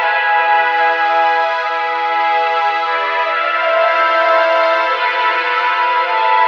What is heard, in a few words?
strings, sad; Loops